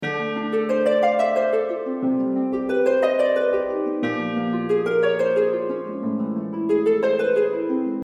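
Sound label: Short RPG, BGM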